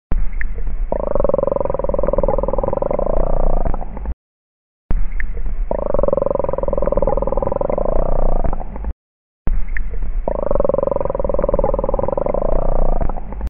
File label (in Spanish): croac